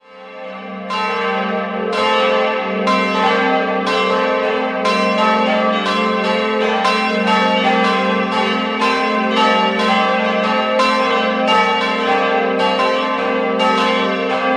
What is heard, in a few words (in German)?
Rednitzhembach, glocken